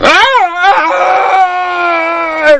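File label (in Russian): крик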